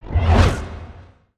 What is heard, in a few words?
asteroid whoosh by